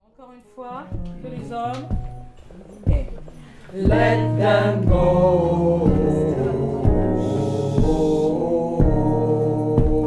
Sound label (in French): partie homme, gospel; partie 2-2